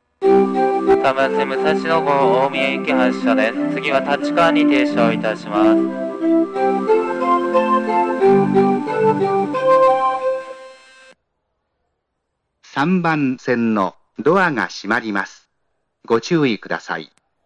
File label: hachioji melody; 余韻切りです; 中央線快速電車 国分寺・三鷹・新宿・東京方面 ■中央本線 高尾・大月・甲府・松本方面